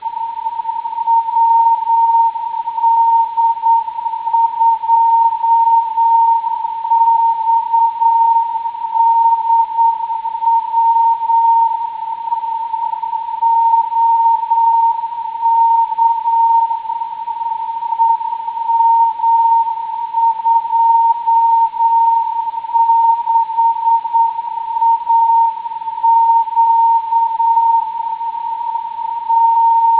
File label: cw, 137khz; lf; wd2xdw